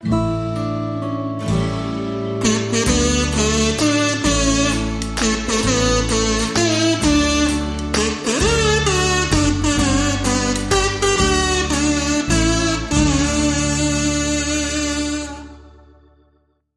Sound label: Kazoo Happy Birthday